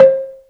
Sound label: ZYLIMBA; Greytsounds VOL-1